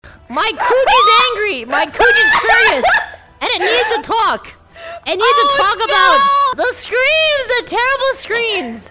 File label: screams; coochie needs to talk